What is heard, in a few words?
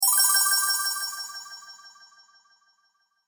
Fairy Sparkle Delay, magic; fiction sound effects